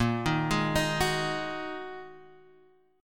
A sharp 7th Suspended 4th Sharp 5th, Guitar Drop D chord